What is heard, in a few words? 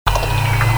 Loop